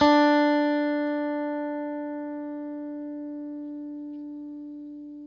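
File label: Stratocaster, Guitar; Quantum Leap; 90's Sample CDs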